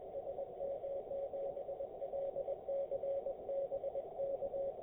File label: 1296MHz 834km; SM7LCB